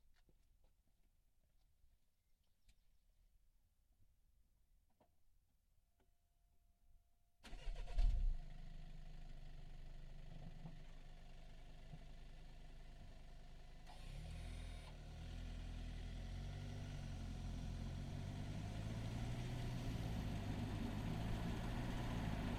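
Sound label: VW Golf Diesel onboard medium Start Drive Stop Off Reverse Stop Off Drive Horn; Golf Variant TDI 2019; EXHAUST; DPA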